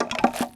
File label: bucket foley; movement; tusk